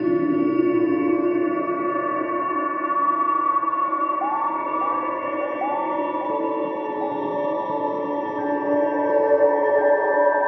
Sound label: Space Bells, Spacey; Zero-G Ambiosis